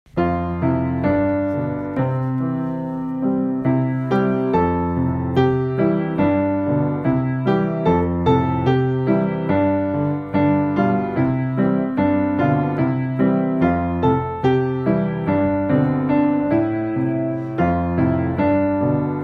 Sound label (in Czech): velikonocni klavir; jaro